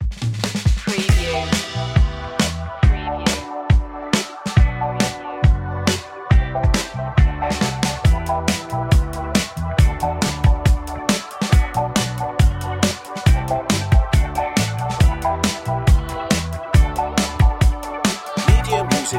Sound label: fashion reggae